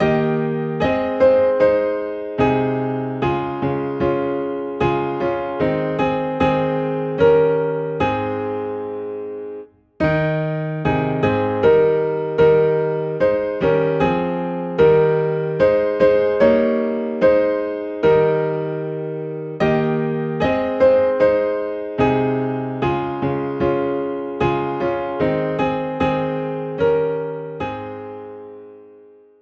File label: Piano in A Flat; Oh for a Thousand Tongues